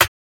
Snare

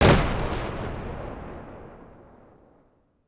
Stomp; Scholarship

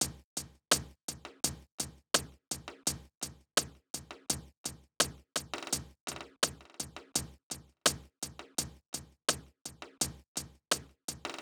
84 BPM Perc Loop

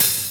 Closed Hats

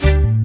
Strings

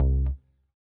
Acoustic Bass, 808s

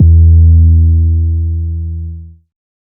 808's

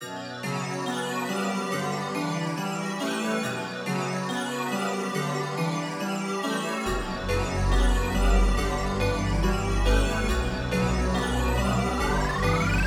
Drumkit; 808; SHOBEATS - 808 MAFIA

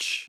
Beatbox Chuh; Vox

